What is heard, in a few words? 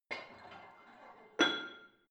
barbell plate put on hit damped soft, fitnessstudio; sport freizeit